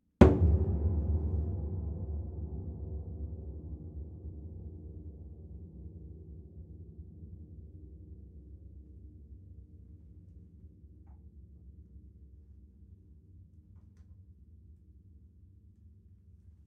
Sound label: Piano Hits